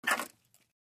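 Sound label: pen stroke sound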